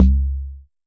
Jomox MBrane, drum machines; Jomox MBrane 11

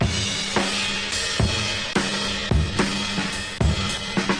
big stretchy break, 109 bpm, drum loop; 101 to 110 bpm

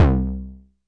mbrute syn, Arturia MiniBrute

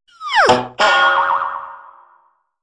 cartoon whizz boing; Cartoon FX

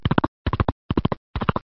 Gallop, cheesy lo-fi

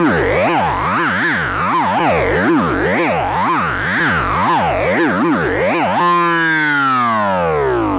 alarm wobble bass

